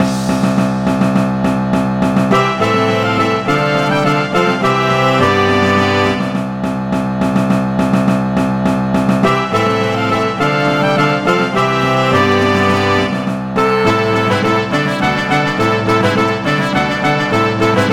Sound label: Fanfare; LLL 7 Fanfare 4; loves labors lost music